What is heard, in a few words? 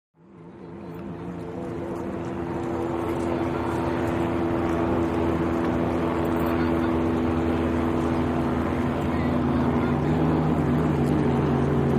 Hydroplane Dock Amb